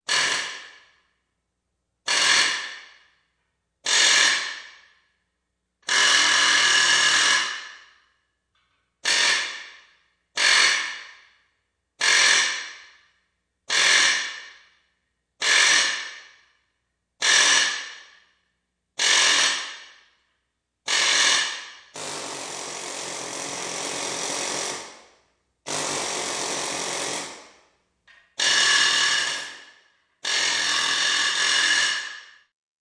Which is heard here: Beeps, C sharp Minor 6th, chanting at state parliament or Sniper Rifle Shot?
Beeps